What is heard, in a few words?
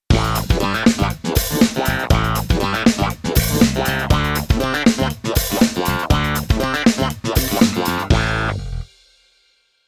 Keyboard Clavi Funk